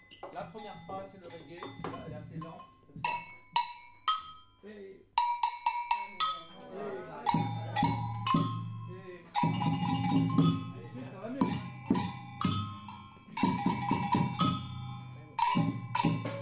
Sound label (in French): samba reggae agogo, batucada